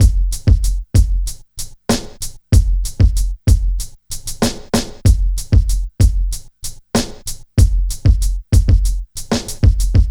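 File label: drum, 95, Hip Hop and R&B; Best Service ProSamples vol.02; AKAI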